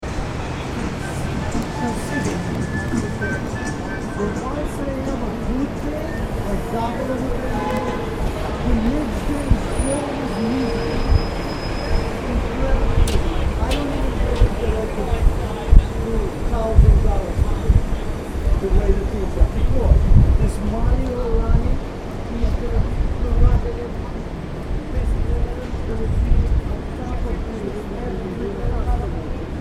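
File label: 16th Avenue Manhattan; Manhathan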